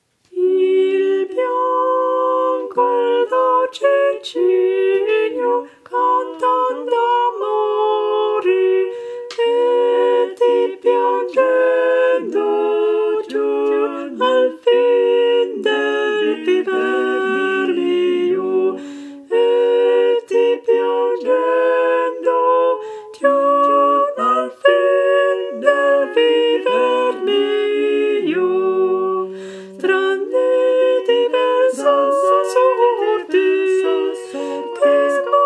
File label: tenor; Il bianco e dolce cigno